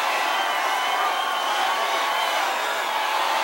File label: party; vegas night life